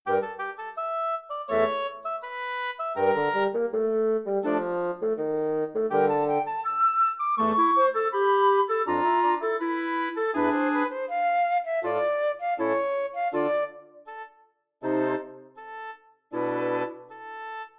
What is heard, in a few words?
wind quintet; mvt 3